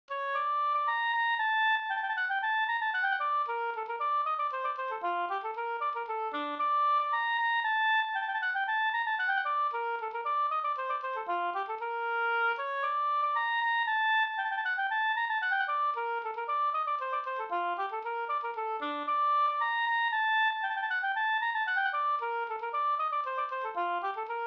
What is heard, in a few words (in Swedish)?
polska storpolska